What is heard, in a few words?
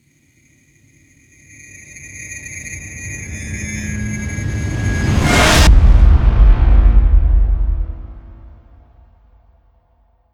Impact, trailer tools, alarming; Narrative Mind